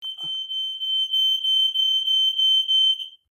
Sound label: smoke alarm Full Siren